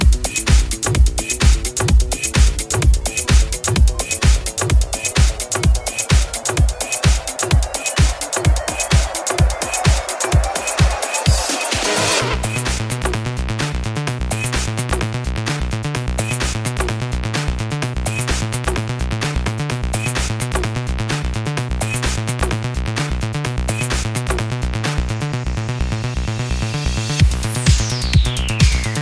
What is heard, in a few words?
House